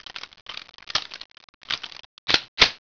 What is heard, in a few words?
grunt reload; hgrunt